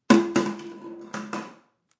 Tupperware Drop, Piece of tupperware dropping onto tile kitchen floor; Kitchen Sound Effects; Sound Effects:Household